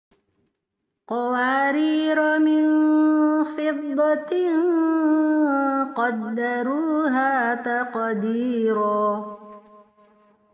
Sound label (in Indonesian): Perekaman baru Karaoke denoised; cara waqof; cara waqaf